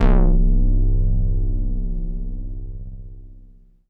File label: Chorusio c1, Korg Poly-800